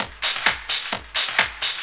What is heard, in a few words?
130 addon, DRUMLOOPS, HIHAT